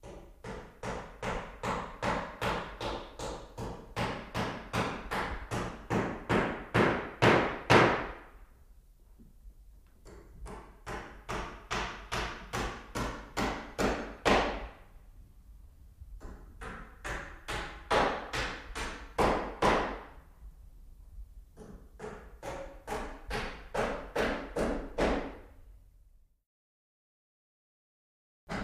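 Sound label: Hammering Nails Interior; hammers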